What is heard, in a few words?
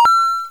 coin